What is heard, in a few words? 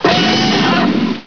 scree roar